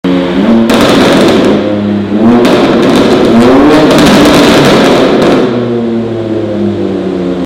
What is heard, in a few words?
Downshifts M4G83 800PS